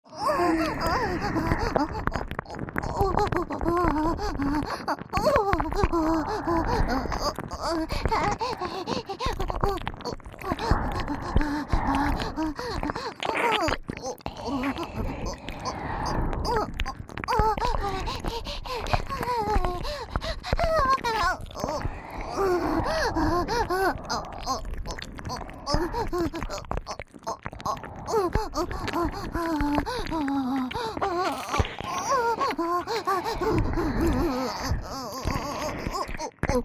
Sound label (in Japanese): ねっとり両耳舐め+オホ喘ぎ声 SE無; 3WAYループ; オナサポ